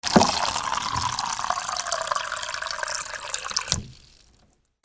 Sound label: KITCHEN